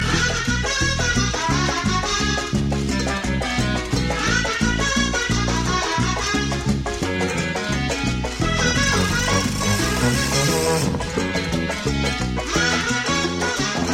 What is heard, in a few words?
Hiphop Ringtone